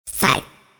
connect; monstergo; pokebot

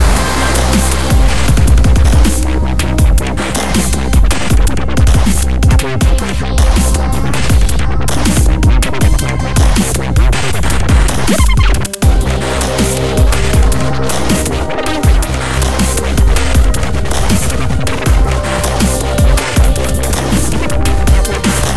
music; inlogic-basketballsmash

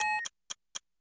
time beep; Domino

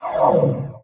powerups magnet; spider-man-mysterio-rush-game

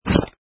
menu move; inlogic-castledefense

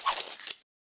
card deal; Hearts

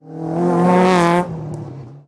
Driveby; Rally Champ

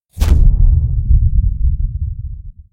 force on; star-wars-rebels-team-tactis; ezra

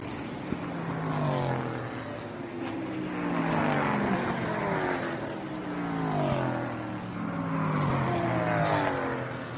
ambience splash and prerace; lightning speed

